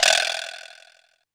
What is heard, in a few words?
technox perc; Quasimidi Technox